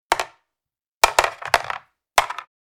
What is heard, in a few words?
Chess pieces drop on chessboard; leisure